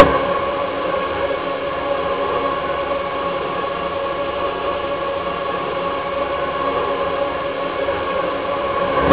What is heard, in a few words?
handel filtered